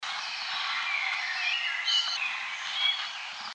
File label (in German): Vogelgezwischer Nebengeräusche